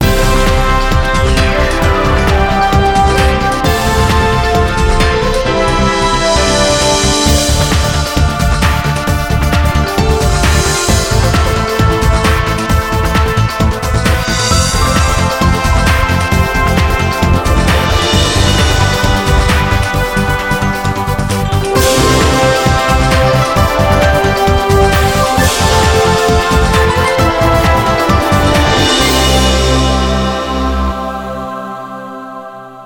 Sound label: clean fanfare